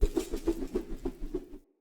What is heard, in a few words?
Throwing Knives Projectile